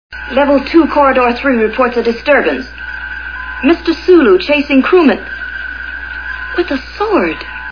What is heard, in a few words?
sword